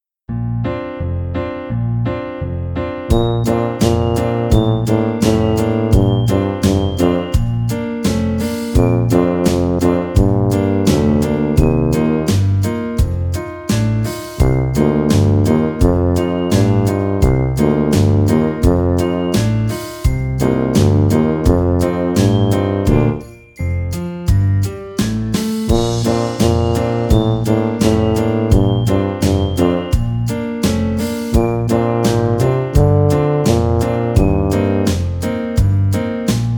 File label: Tuba